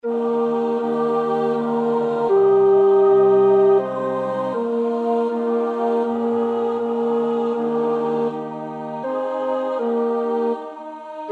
tenor; Attwood (Thomas); Teach me, O Lord

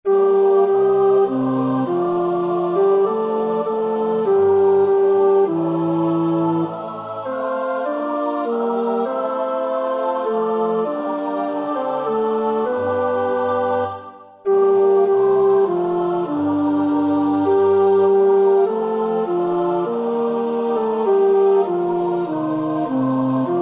O nata lux, tenor; Tallis (Thomas)

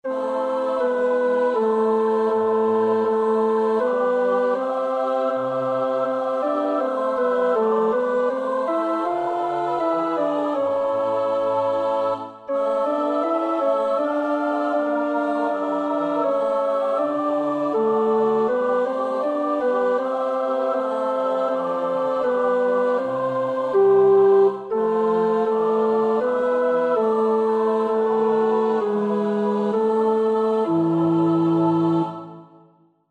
Chorale tenor; Bach (Johann Sebastiaan); 6 Ach wie flüchtig, ach wie nichtig

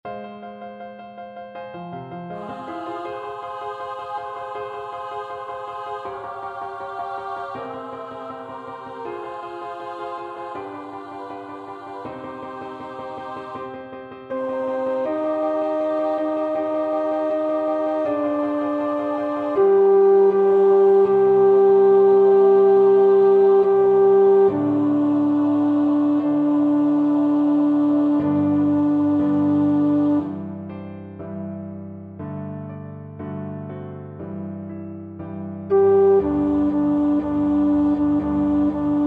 tenor 2; Elgar (Edward); Scenes of the saga of King Olaf

